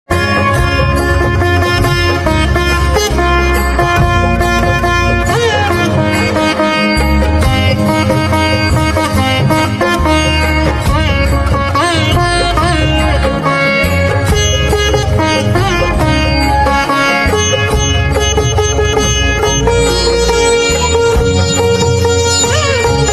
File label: Veena; traditonal devotional